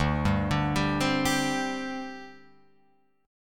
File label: D 7th Suspended 4th, down, Guitar, Open F; arpeggiated; JGuitar